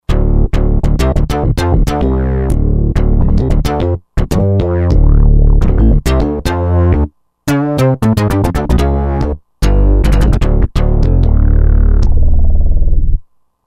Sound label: Bass System, Roland JD-990; Factory Patches